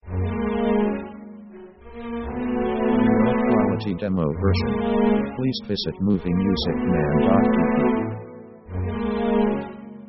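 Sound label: orchestral walz american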